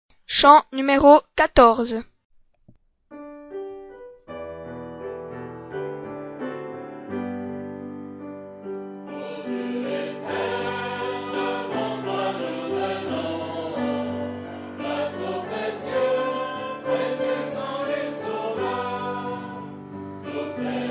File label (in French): Chant; FR